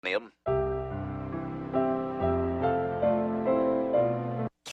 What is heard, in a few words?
humm